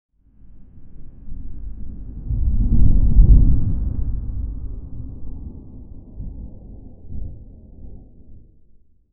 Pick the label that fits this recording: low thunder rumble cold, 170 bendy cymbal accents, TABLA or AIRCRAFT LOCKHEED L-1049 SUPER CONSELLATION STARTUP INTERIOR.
low thunder rumble cold